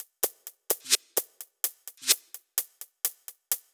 Loop, 128 BPM

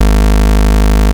Bass Shot G#

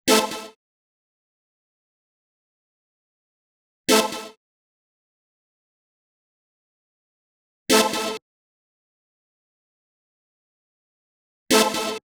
126 B#m Synth, Complex Electro Synths; Prime Loops; Biomass

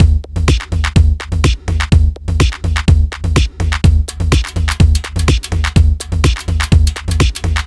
125, Digital Breaks; Loopmasters; Jon Carter & Alex Blanco; Block Party Essentials

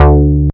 Bass Shot D

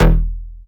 Bass Shot E

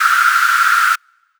FX Misc

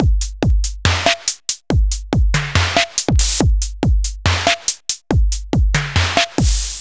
Bpm141 Break, Dubstep, Drum Loops; Mechanix

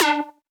Synth Shot D; Mutekki Media; Vengeance Electroshock Vol.2

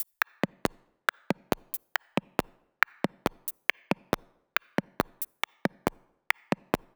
Bpm138 Perc Loop; Blackhand; Dubstep

